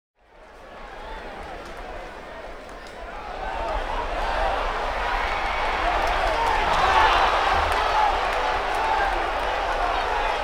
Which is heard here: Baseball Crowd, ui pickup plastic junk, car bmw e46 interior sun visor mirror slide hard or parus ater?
Baseball Crowd